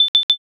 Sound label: timer done